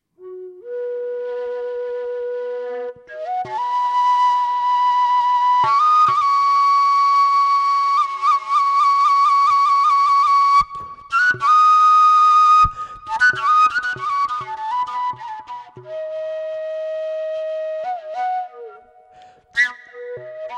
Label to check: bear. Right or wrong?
Wrong